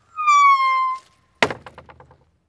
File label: wood gate close